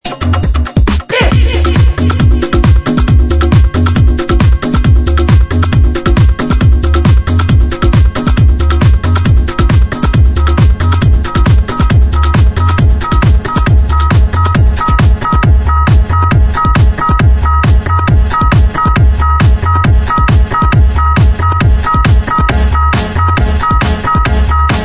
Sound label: funk percussion